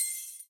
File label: count up loop end; disco danny